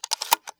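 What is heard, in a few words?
xm1014 insert shell, shotgun; arccw go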